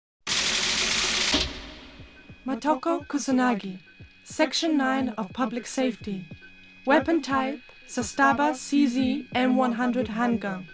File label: Handgun; SE-1 (Handun by MOTOKO); SE-2 (Handgun by BATEAU); Ghost in the Shell; Project 2501 - The Real Image Collection